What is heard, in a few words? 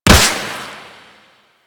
Flak Cannon Proj Hit; Grineer